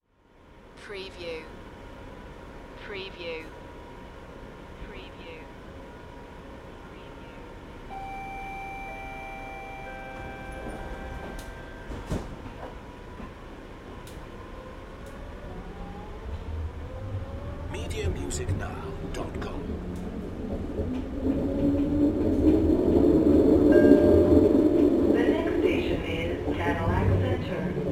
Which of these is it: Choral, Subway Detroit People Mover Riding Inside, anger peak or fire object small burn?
Subway Detroit People Mover Riding Inside